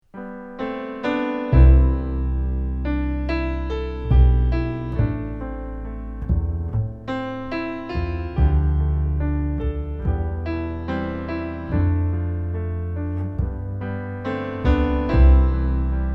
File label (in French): Irish music piano contrebasse